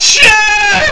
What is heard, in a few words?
Shark Killed; Barrack 1.0.0; Barrack Sounds